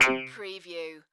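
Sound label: Digital Distortion Chime Click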